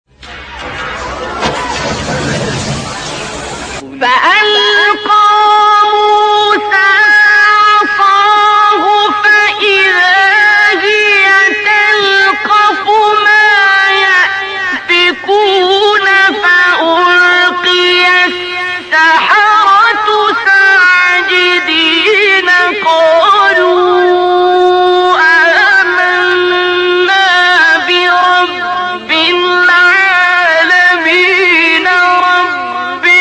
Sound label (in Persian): تلاوت زیبای سوره آیه; شعرا; استاد عبدالباسط